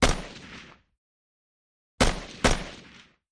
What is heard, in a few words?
Russian SKS Single, sks 7x6